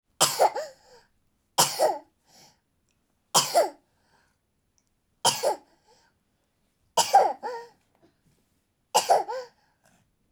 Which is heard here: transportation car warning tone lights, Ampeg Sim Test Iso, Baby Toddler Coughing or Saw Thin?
Baby Toddler Coughing